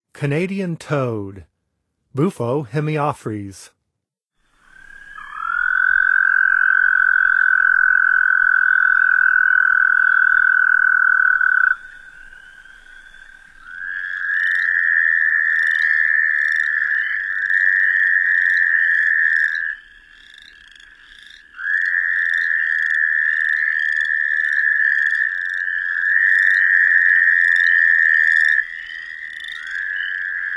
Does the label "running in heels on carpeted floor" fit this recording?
No